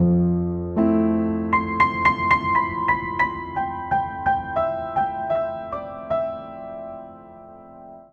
Phrase Wistful IVmaj7-ii7 C Major 118 bpm, Upright Piano; Ableton; Factory Packs; Upright Piano by Spitfire Audio